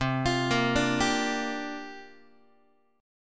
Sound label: C 7th Suspended 2nd Suspended 4th, guitar chord, down; arpeggiated; C7sus2sus4